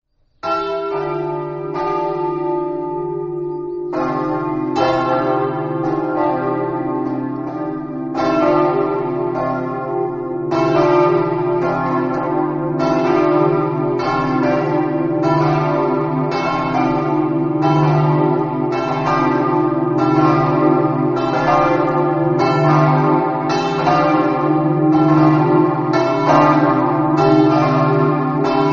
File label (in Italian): savorgnano, campane